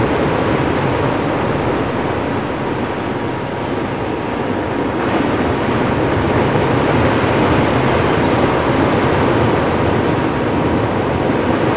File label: 16 waves